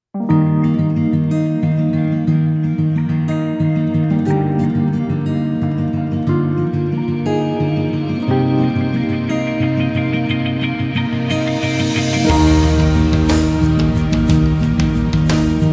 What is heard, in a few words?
TV Score Pop; Der Lehrer; RTL